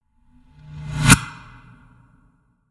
reverse suck in short reverb

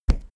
step wood step

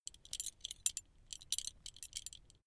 metal belt buckle clatter long soft

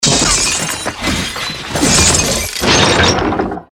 destruction body impact

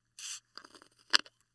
opening a beer can

